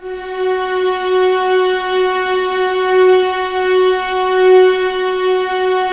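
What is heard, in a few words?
F#2 legato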